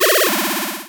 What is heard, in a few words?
Cry